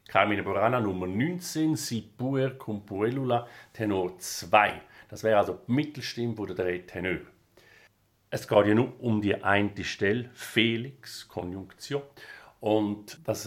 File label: Tenor